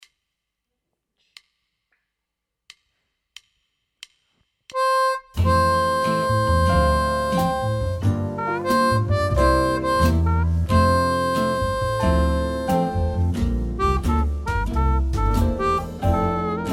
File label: F 90 harp